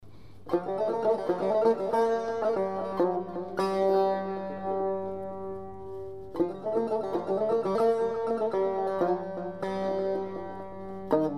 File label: persian tar audio sample